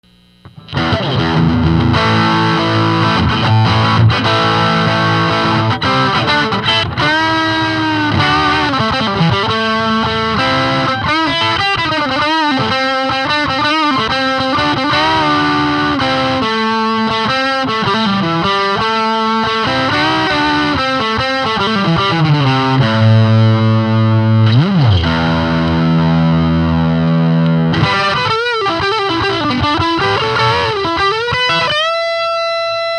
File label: 6L6GC